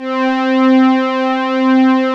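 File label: Juno brass, analog synths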